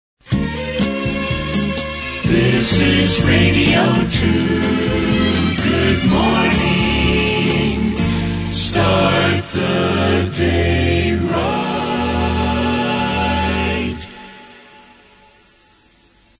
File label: jingle